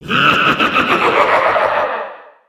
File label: laugh hallow; dota; conquest